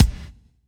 Elec Kick, drum

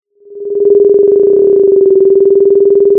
pop, Sound of a phone ringing

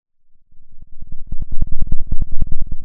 Sound of a thunderstorm, center

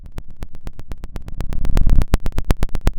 pop, Sound of a fire crackling

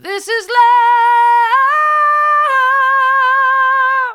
this is love mid, vocal

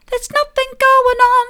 theres nothing going on soft, vocal

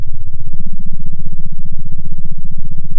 pop, sound of a small motorcycle

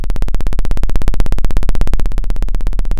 Sound of a plane taking off

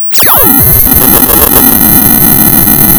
pop, tearing paper

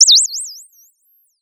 Sound of a bird tweeting nicely

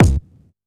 Vinyl Kick, drum

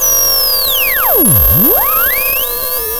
sound of a bike bell

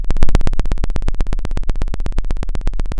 Sound of a drum roll

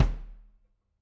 Loose Kick

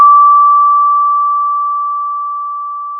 Sound of a school bell

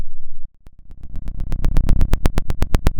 Sound of a fire crackling

